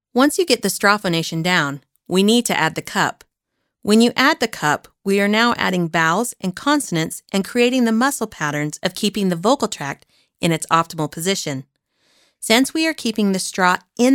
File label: Articulation Cup warmup; voicestraw